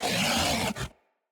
Kubrow Feral Vocal Melee Attack

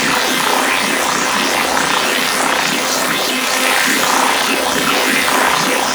Miter Alt Shot Loop, Weapons; Warframe; Tenno

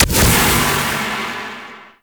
Pillar End Burst; Warframe; Revenant

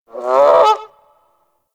Forest Creature Mid Medium, Ambience; Warframe; Grineer

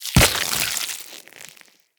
Root Spider Web Shot Proj Burst; Animals; Spiders; Abilities

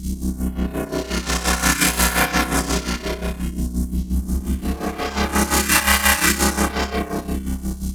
Ship Hologram Loop; Corpus Ship

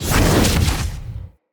Casting Device Cast Dual; Fenn

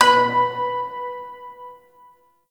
Shawzin Oct Two B; Warframe

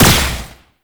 Pistol Projectile Explosion; Warframe; Weapons; Tenno; Temple Signature Pistol

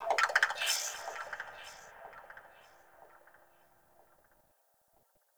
Woodpecker; Warframe; Tau

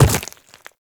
Infested Footsteps Quadruped Medium Land Default; Warframe

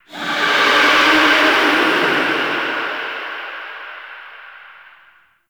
Sentient Use Ability Verb; Enemies; Warframe; Tau; Sentient Dactolyst

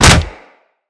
Kick Hit, Melee Impacts; Weapons

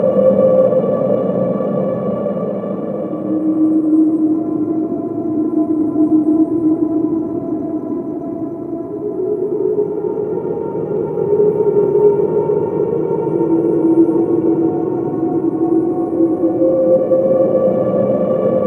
Infested Interior Amb; Warframe; Corpus

